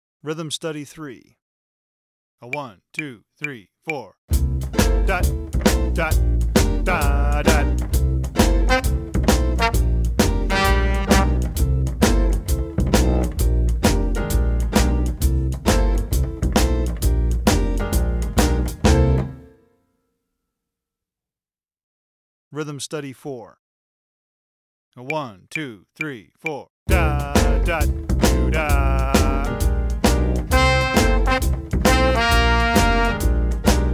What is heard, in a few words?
shuffle rhythm